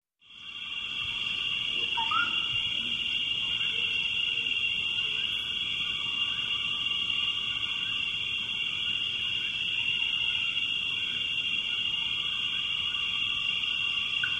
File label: Marsh Ambience Large, frogs